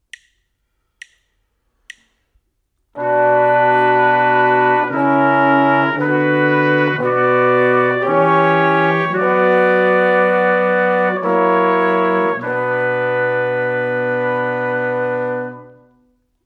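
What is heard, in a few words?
harmonic dictation, brass quartet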